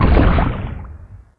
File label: soil in water